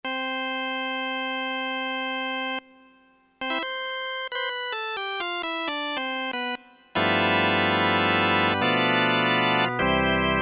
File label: Organ, Korg DSS-1, Created Waveforms